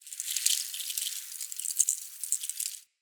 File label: Forest Dry Leave Move; Ambience; Midrath Island